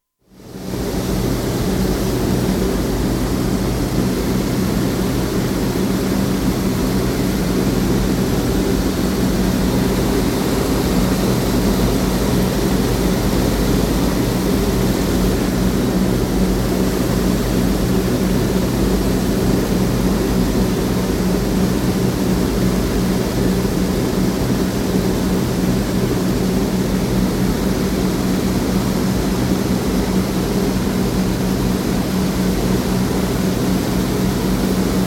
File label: Avro Lancaster mid-gunner's position, constant flight (World War II bomber, 4 piston engines); Airplane Sound Effects; BBC